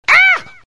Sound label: pain; Dota 2; batrider